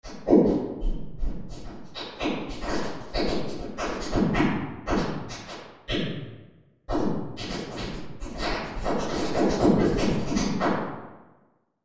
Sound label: large room dark, freeverb; .mp3 file - wet = dry - 26.7dB*